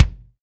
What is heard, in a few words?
Kick Dry